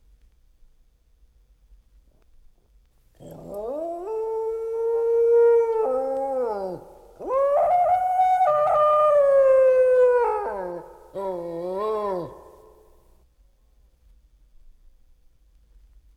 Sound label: Dog Howling; Jac Holzman; Authentic Sound Effects Volume 2